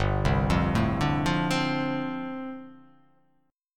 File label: G 9th Suspended 4th